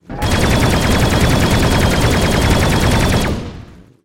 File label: Rocket Barrage; Atomic Ray Thrusters